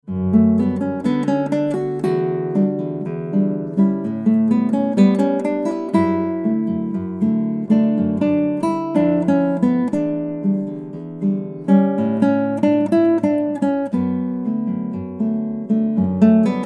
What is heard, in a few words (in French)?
bossa, guitare